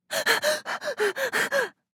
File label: Woman excited rapid breathing